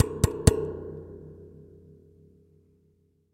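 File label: sounds of tapping finger on microphone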